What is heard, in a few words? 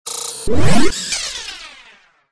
machine